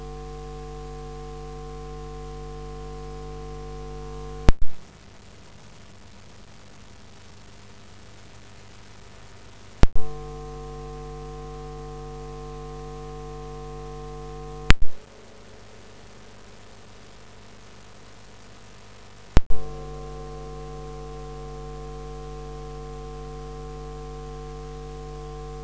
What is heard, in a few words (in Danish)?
Stoj Vintage Uden GND Efter